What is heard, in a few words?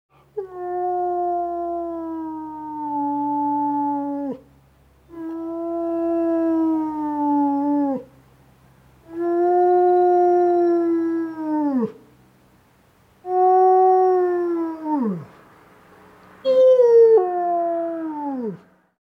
Wolf Howls